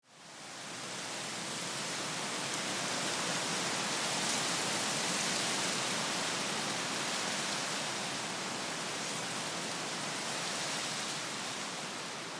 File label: Day Wind Gust Calm